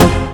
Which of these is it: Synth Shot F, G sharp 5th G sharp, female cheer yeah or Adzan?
Synth Shot F